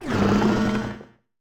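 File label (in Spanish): Grito, Pokémon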